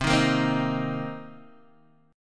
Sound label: C Minor 7th C Guitar Open D